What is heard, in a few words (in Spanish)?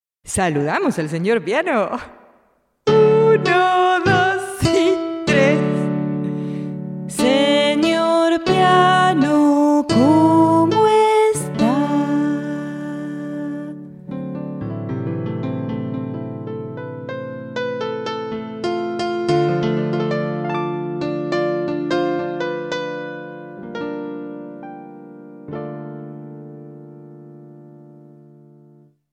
piano triste